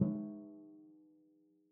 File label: Timpani hit; Timpani 2; Struck Membranophones